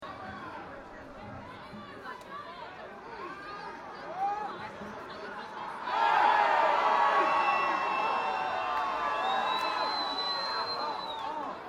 England London Football Crowd Reactions Small Crowd Foul Whistling Shouting; Tom Hutchings